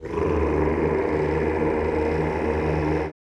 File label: CHO ES1, Rrrr; Sound & Vision; Gigapack I CD 2; CHO Mmhh + Rrrr